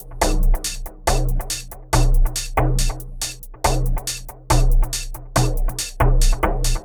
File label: Electronic Percussion